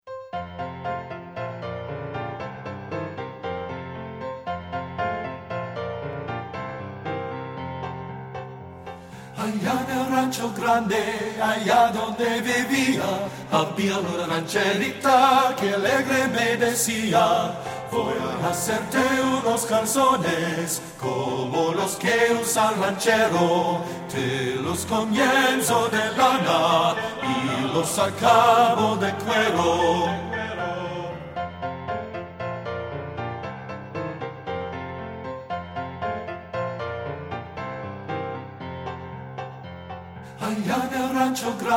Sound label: BASS